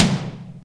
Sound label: verby tom drum one shot c sharp key